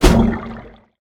swing trunk; smite; god ganesha skin11

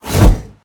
swipe; smite; god cthulhu

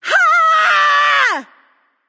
battlecry light; smite; voicepack; hel

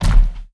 footstep; anhur; smite; anhur skin13

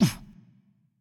greek hit react small; npc; smite; roman archer

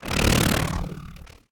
wing; smite; khepri

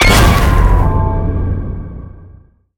Explosion; Smite; god Izanami

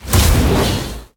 cast fire; smite; god merlin

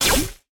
deflect; smite; medusa

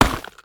backcrack; smite; god atlas

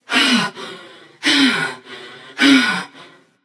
breath passion; chaac

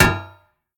shield tap; smite; lancelot

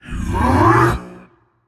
breath, voicepack; smite; jorm

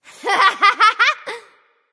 laugh; smite; voicepack; jingwei

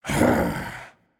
ugh, voicepack; smite; jorm

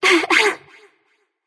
heh heh light; smite; voicepack; hel

